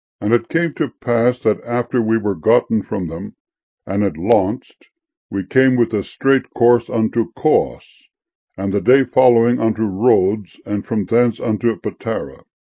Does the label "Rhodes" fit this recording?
Yes